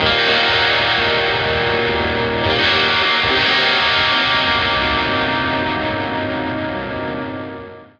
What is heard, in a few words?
Lake Hi Gtr E flat 9